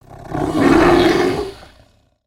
Fire Breath Growl; creatures organic

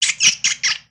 Pet Bunny Ecstatic; creatures pets; Lego

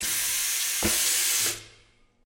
air release; spaceship

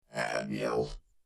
robot; build mode

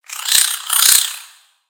Spider Dazed; creatures mechanical; Lego

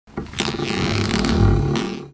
Jail Cage Swing, gnarled forest

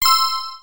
Coin Pickup, ui; aronwk collection; ndaudio

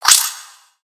Spider Attack Melee; creatures mechanical; Lego

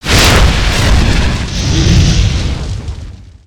Dragon Flame; creatures organic

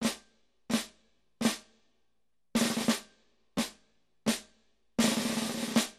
snare

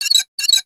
Welder nozzle twist; foley

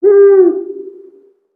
Owl Call; Valiant Summoner

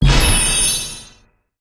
Breadcrumb pickup

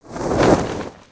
Pirate Lay Down; gnarled forest

